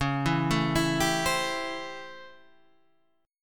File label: A 7th Sharp 9th